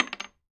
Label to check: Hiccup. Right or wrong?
Wrong